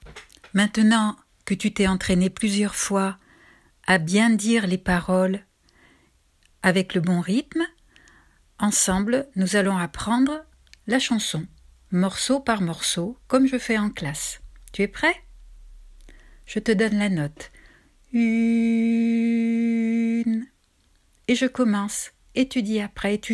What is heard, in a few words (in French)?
une tulipe mélodie